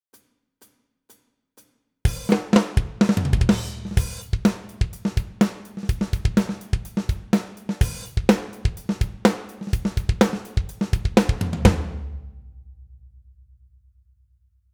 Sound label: DRUMMING 101 AUDIO EXAMPLE, Drum Programming